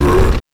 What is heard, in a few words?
CRAWLER BUNCH damage; Mob